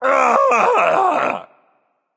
ugh, voicepack; smite; jorm

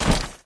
step with armor; smite; chronos

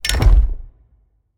armor; smite; chaos titan

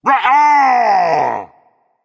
yay berserk; cuchulainn

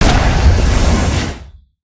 arcane activate; smite; god merlin

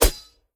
swish; smite; gilgamesh

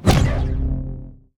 proton fire; smite; zhongkui

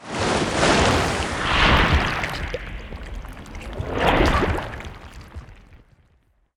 lobby victory water start; smite; poseidon

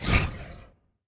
grunt; fenrir

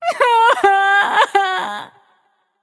boo hoo; smite; jingwei

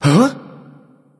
surprise; smite; hou yi

